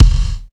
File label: DX MIX BD, DRUM; AKAI S6000 CD-ROM - Volume 1